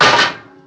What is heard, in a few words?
metal dug; everness